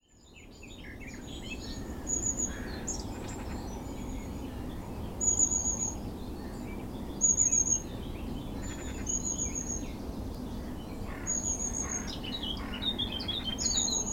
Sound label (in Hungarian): oszapo Aegithalos caudatus